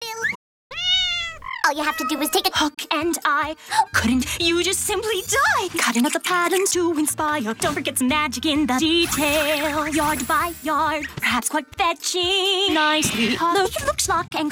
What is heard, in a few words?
vocals, 130BPM; Pony Fan Music Remix Stems